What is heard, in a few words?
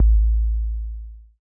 kick 808 Am wobble; Kicks; single hits